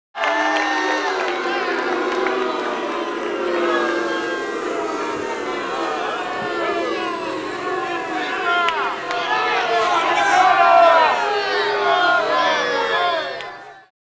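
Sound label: medium crowd boos hisses; Asian Show Sound Effects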